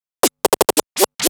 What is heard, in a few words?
Scratch Fills 140BPM